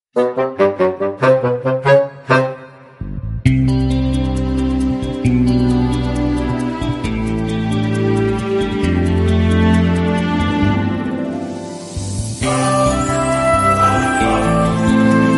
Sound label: Happy Birthday - Instrumental